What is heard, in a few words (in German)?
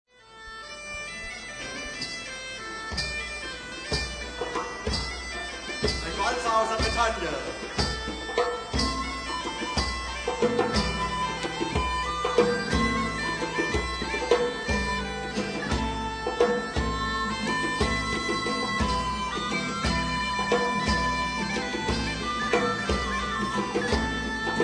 Bretonischer Walzer